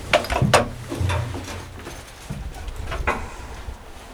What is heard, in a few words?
Vehicle reloads; Red Orchestra: Ostfront 41-45